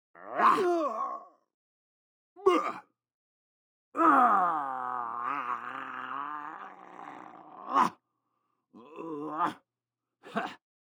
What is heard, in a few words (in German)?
exikution; for honor